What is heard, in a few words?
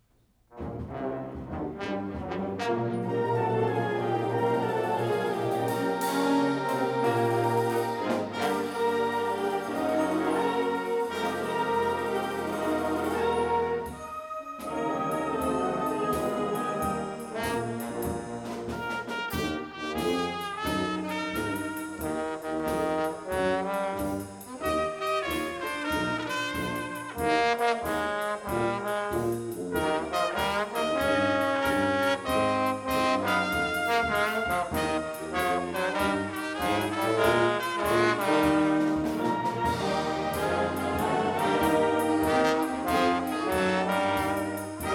Dixieland jam; SpringPops